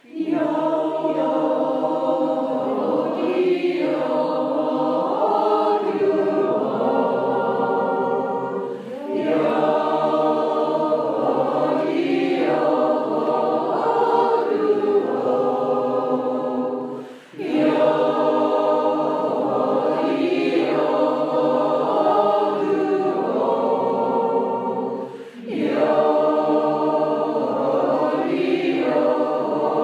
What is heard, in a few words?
Klausen Swiss Tune 3 Choirs